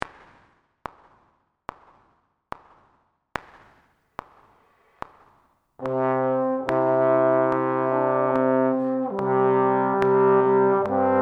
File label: Maj C, trombone; The Tone Ladder